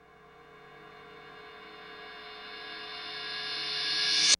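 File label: reverse cymbal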